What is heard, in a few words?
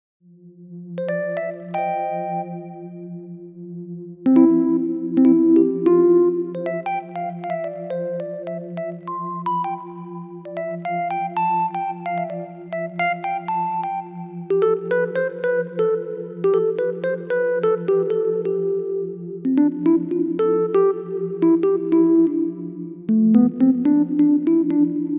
elecpiano